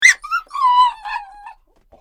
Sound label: crying